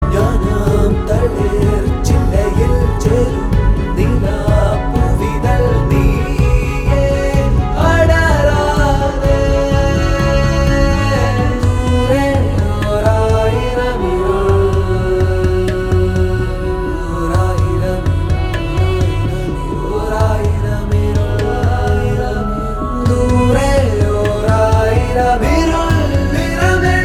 Love Ringtone

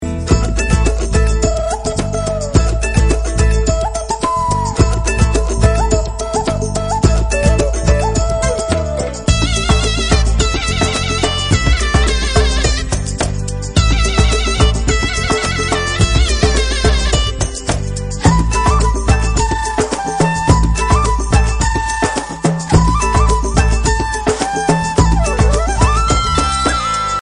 Beats Ringtone

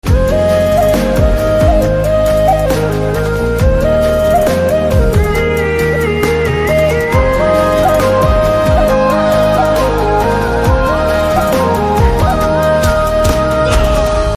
Flute BGM Ringtone

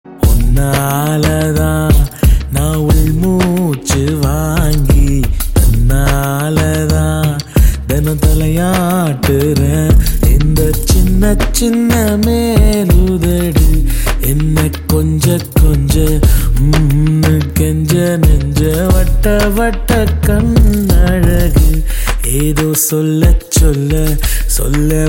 Male Ringtone

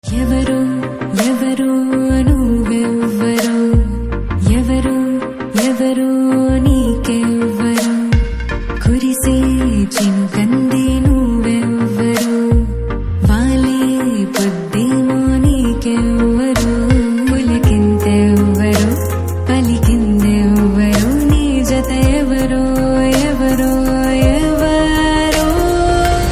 Ringtone; Ranarangam

